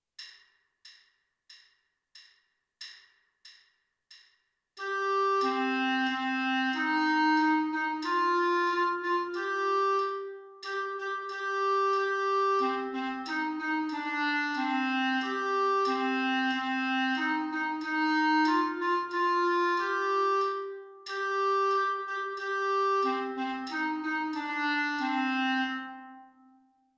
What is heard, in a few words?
clarinet